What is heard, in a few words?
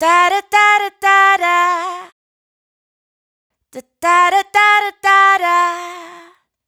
riff Dada Da Fm, Dry Vocals; Raw Vocals; Slate Digital Omnivox Vocal Sample Pack